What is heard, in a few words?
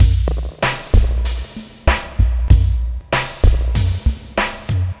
Acid Mod Drumz, lounge, 096; Zero-G Kocktail Kollection; Construction Kitz